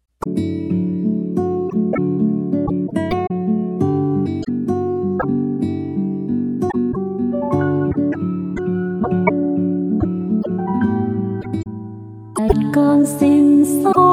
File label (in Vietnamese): Nhạc Phật Giáo 432hz